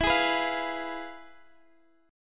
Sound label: F Diminished E; strummed